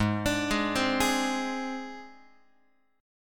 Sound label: G sharp Major Flat 5th, Guitar; G#Mb5 arpeggiated; JGuitar